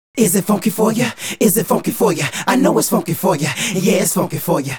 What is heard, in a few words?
Male Group, Vocal